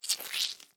squish

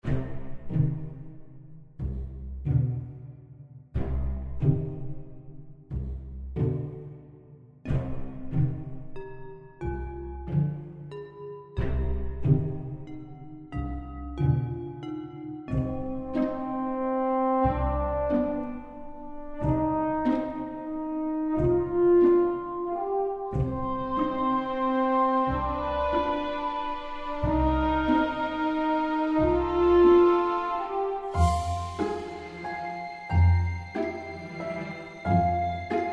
Sneaky Suspense

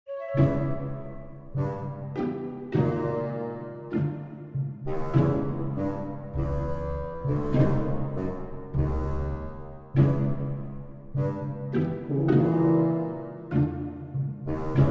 Quirky Suspense

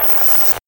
static

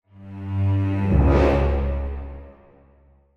mini game loss

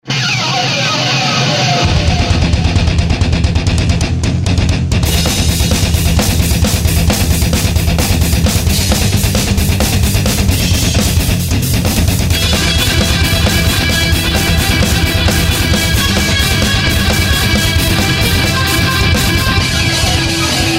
battle intro loud

